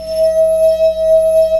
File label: DSP; OMI - Universe of Sounds